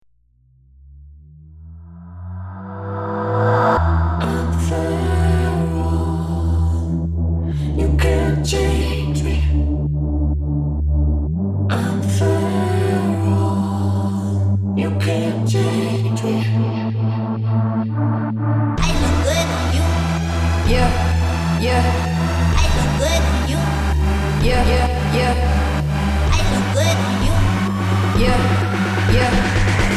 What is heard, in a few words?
KEEP IT JUMPING BPM 128